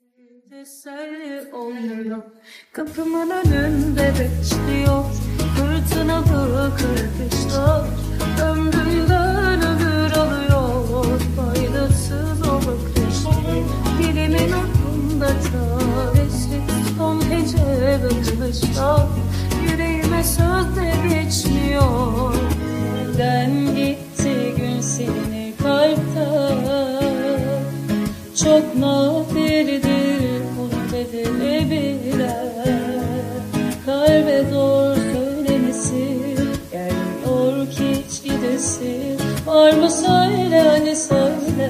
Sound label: Acoustic Version